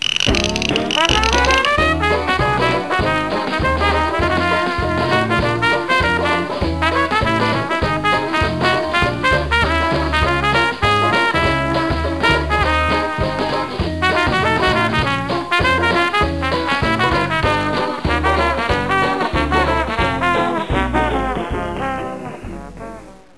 Record ditty; voodoo vince xbox gamerip 2003

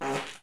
fart ripper

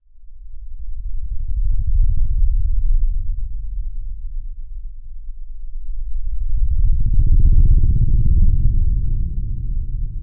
Horror Ambient; Chrono Ark

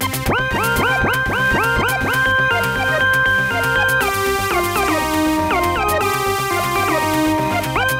Aerobics; Dreamer Series Top Model; 2009 nds gamerip

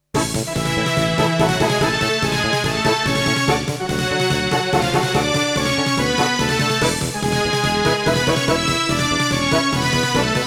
BGM Synthesizer Version; Super Famicom Magazine Vol.11

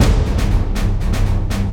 Orchestral Battle Neutral stereo

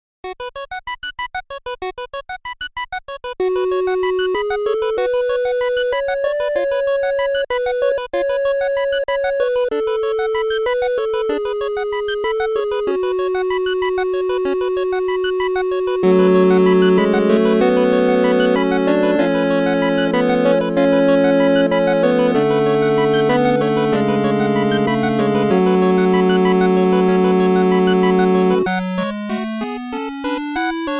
8-bit; Lenna's Inception; Hollow Palace

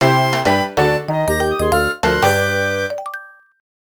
Boss Clear; Yoshi's Island DS

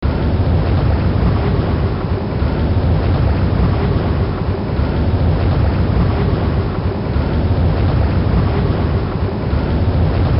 Thermal Ambience; Falling Upwards; Lego Bionicle The Legend of Mata Nui

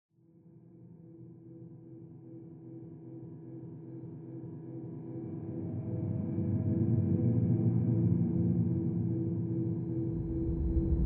Subway Gas Mask Explore Mood

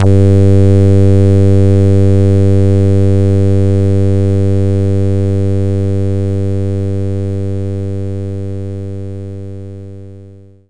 ow bass; knights try

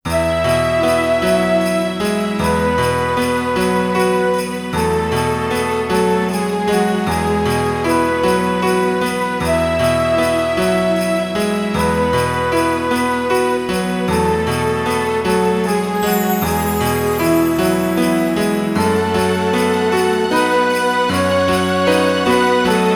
Synth Version; Tentama Sound Collection Alpha

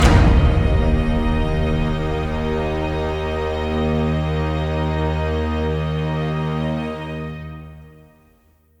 Generic Ambient Combat End; The Darkness 2 gamerip 2012

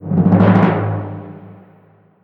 Timpani roll

